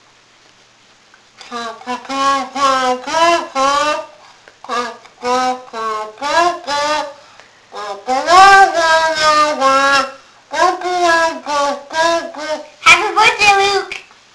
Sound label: happy birthday, A kazoo serenade; Uncle Luke